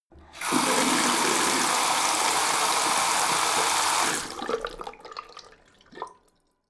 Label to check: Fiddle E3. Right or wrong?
Wrong